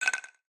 refresh; IceCubes; Mastodon

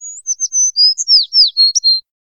amb bird; environment; OpenValley

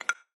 bookmark; IceCubes; Mastodon

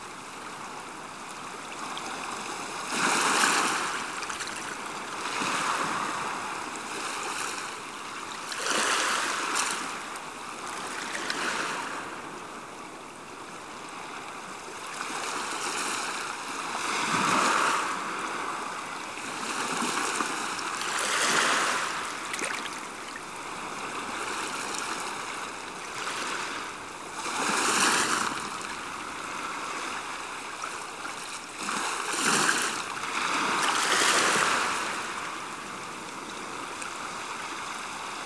seaside; OpenValley

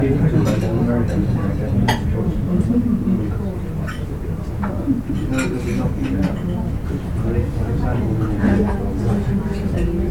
coffee shop; OpenValley

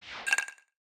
toot Sent; IceCubes; Mastodon

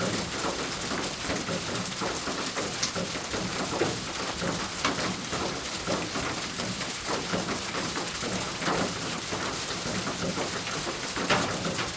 light rain; OpenValley

